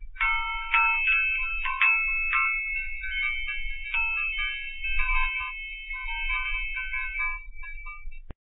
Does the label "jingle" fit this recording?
Yes